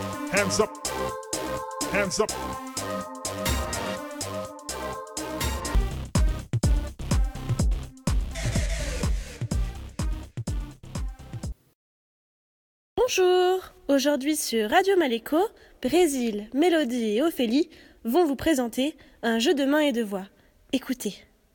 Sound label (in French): Jeux de mains jeux de voix; webradio